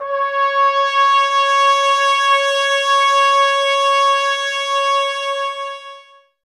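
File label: brass trumpets C; 90 s Sample CDs; Miroslav Vitous; Woodwind Brass Ensemble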